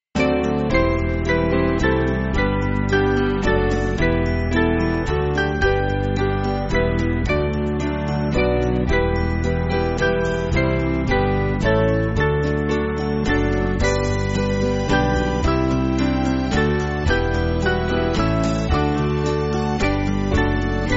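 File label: Band, Backing Files for Churches; Rejoice The year; Aeterna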